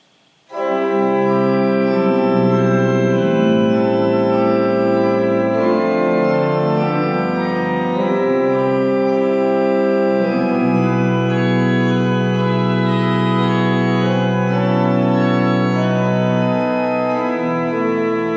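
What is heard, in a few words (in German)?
Zeilhuber, pfeifenorgeln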